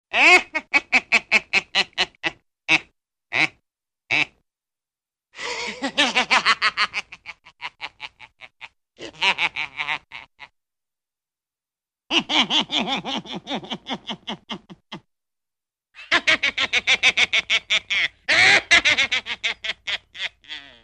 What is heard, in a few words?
Evil laugh